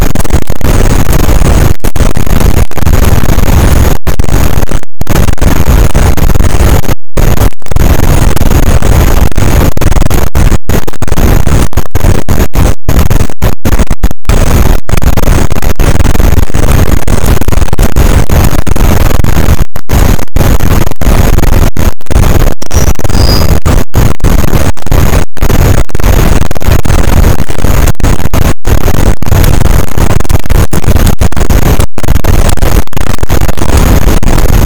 cachalot positif, boat; Physeter Macrocephalus; SNR -6dB

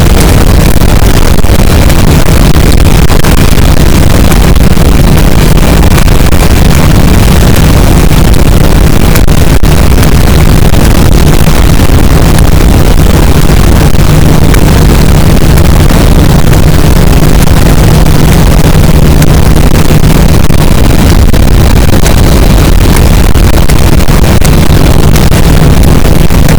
cachalot positif, boat; Physeter Macrocephalus; SNR -6dB

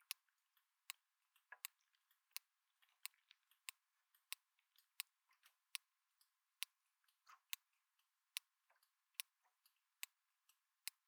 cachalot regulier echo

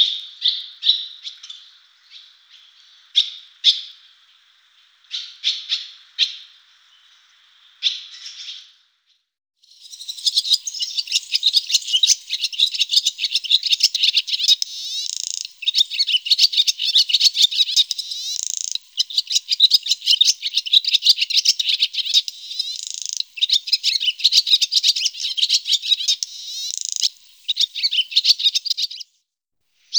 hirondelle rustique; TOULON; PRESQUILE

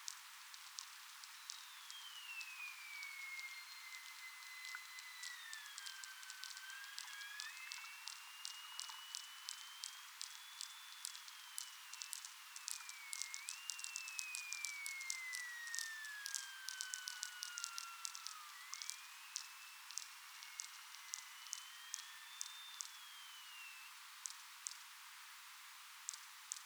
DAUPHIN COMMUN ralenti 5 fois

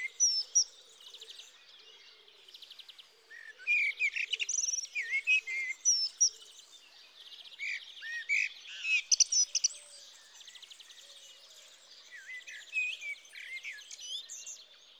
with energy merle noir; TOULON; PRESQUILE

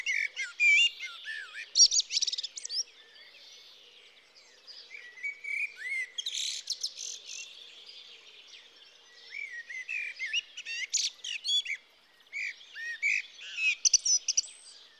with energy merle noir; TOULON; PRESQUILE

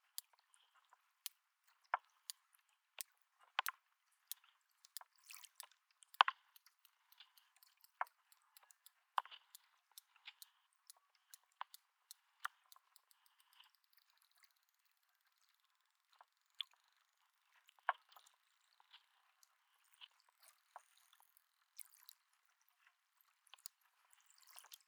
cachalot calmar

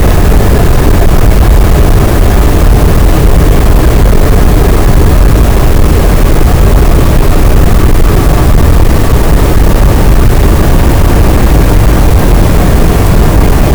cachalot negatif, gaussian noise, Physeter Macrocephalus; SNR 24dB

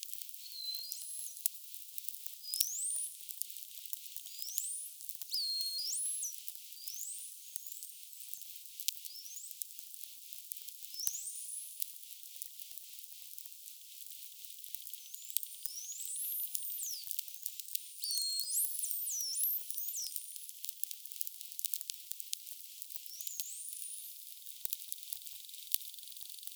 GRAND DAUPHIN

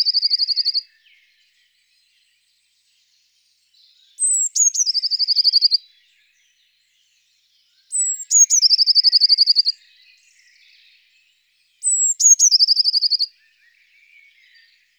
mesange bleue; TOULON; PRESQUILE

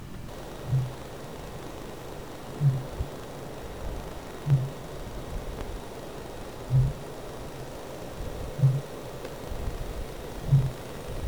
rorquals

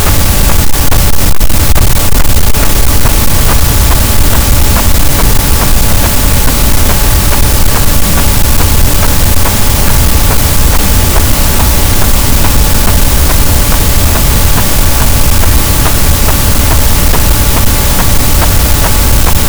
cachalot negatif, gaussian noise, Physeter Macrocephalus; SNR 12dB

